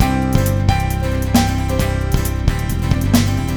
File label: FOLK, GROOVES